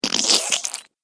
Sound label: Messy Splat Sound; Slimy Sound Effects